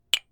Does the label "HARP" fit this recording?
No